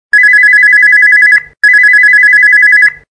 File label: highpitch; LG KH1200